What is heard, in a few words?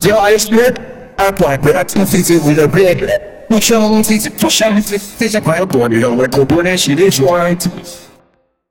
a very soft natural human, emotion